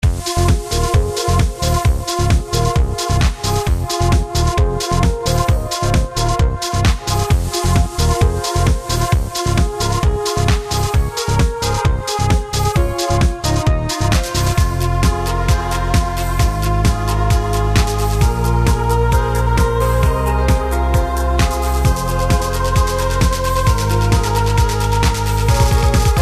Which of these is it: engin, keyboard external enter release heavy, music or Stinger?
music